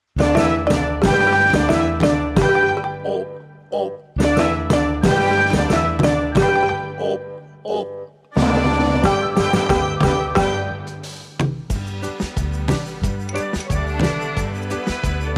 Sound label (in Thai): backing track, เพลงร้องทำนองไทยร่วมสมัย สำหรับเด็ก; กบเต้น; frog